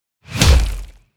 Power punch fight

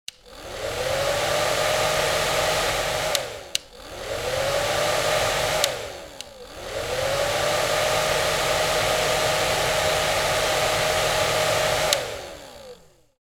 Hair dryer on and off multiple times; Household Appliances

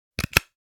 Paper hole puncher single punch

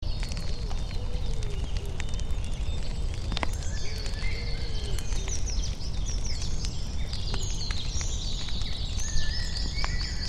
Forest campfire crackling ambience

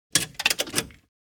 Motorcycle keys and ignition locking; vehicle

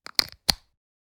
Headphone jack insert; headphones and speakers

